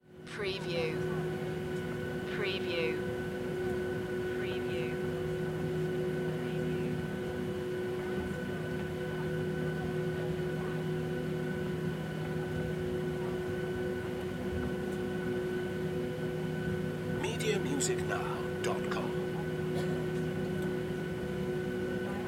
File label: Airplane On Ground; sound effects bible